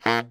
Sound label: BARI FF A#1, saxophones, SHORT FALLS; Greytsounds - Philip Wolfe David Crozier's Saxophones